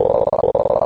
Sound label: frog croak; N64; YOSHISISLAND